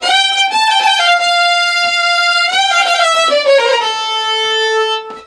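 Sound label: Balkanish Traditions strings; USB Soundscan vol.63; AKAI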